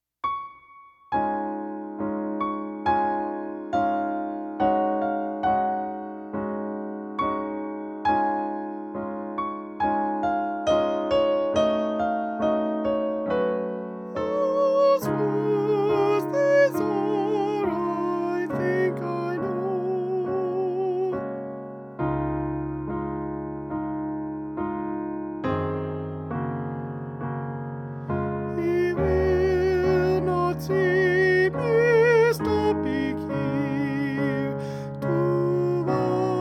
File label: soprano